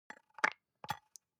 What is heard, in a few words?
object bathroom soap dispenser press squish soft